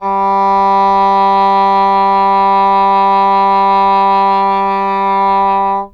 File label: English Horn G2; Standard Instr